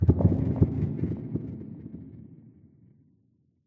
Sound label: amb abstract bubble impact, ambient; Half Life Model Pack